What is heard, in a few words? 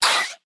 howl little monster